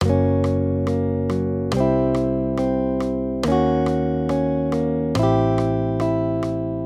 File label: maj triads; Gb-C; accompaniment